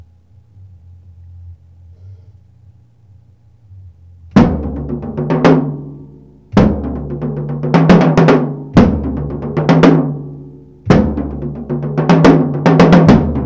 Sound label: tom tom pattern 110, acoustic